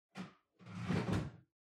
Washer Front Load Far Filter Upper Insert

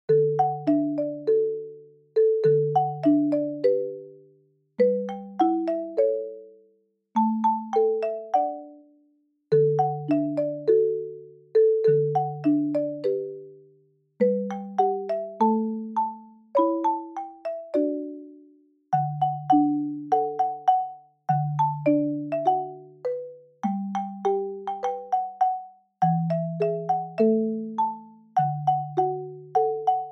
Jingle Bells, Slow

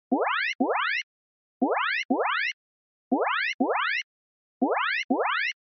Emergency Alert

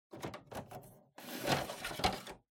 Air Conditioner (Filter Remove)

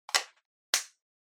Handheld Transceiver, Battery Cover Close

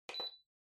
Electric Fan Far Switch

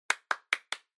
Applause Random Short Fast

